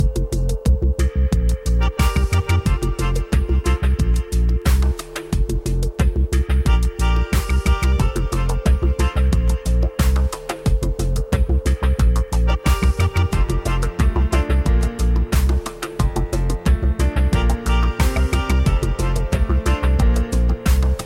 Folk Quartet

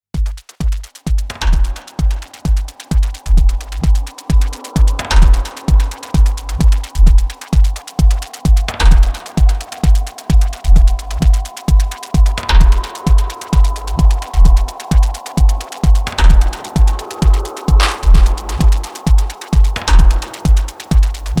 Drum Tool; Skins

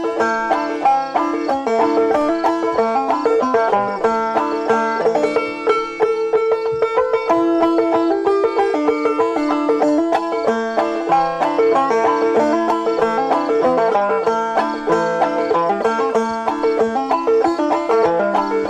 banjo instrumental

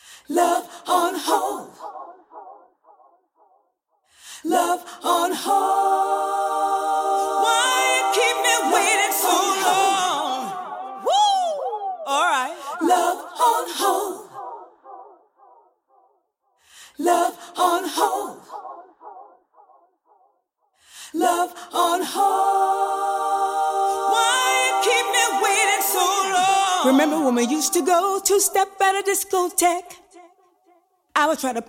Accapella

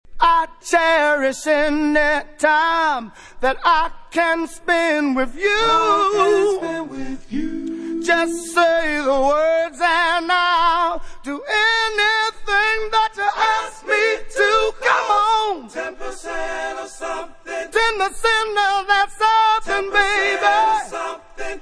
(acappella)

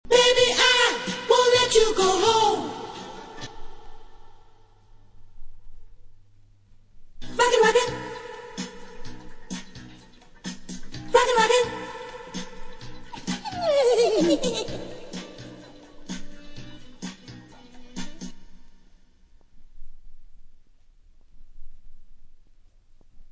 original background vocals acappella

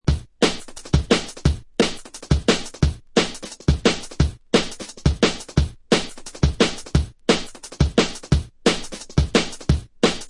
Dread Straight Step; ray keith dread jungle breaks vol 1 60 beats for dubplate clashes battle junkies

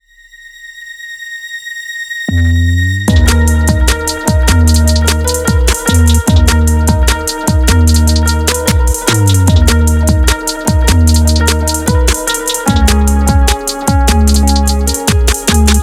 Trap Melee Rush (instrumental)

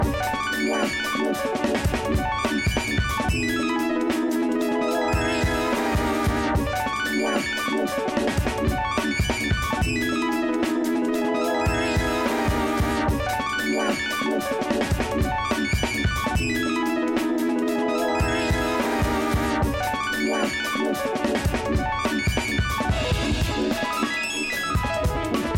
Drums; Wing Tai Drums

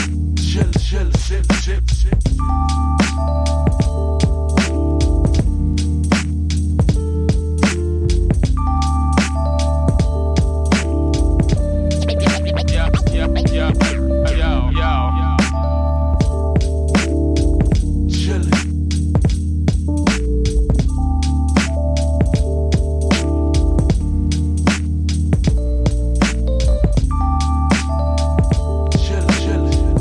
Chill & Sip

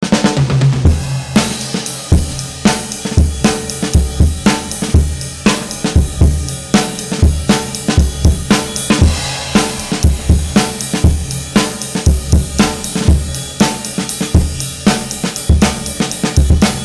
Drums Working, breaker beats

